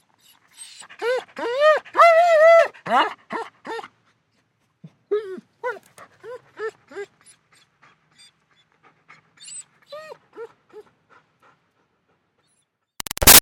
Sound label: Dog German Shepherd Pants Whines Excited; Animals; Dogs